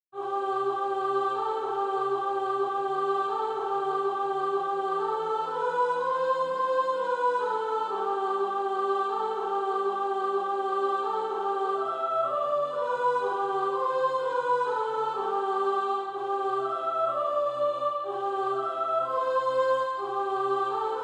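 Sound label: soprano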